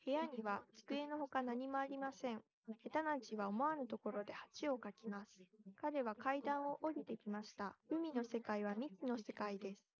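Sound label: female, instantaneous mixture; SiSEC11; underdetermined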